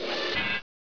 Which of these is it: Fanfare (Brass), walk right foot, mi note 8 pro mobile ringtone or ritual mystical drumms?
walk right foot